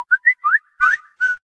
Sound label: Samsung M31 Notification Ringtone